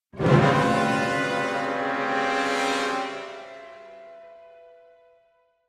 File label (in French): Accord initiel